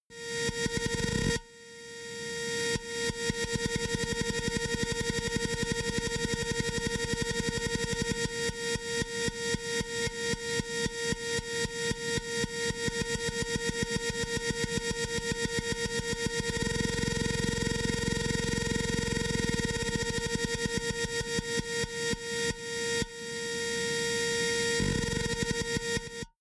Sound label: sci-fi scanner